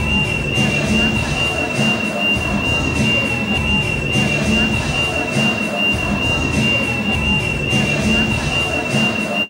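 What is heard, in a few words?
market ambience; best service Blue Box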